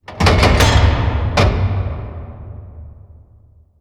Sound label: close railroad track raise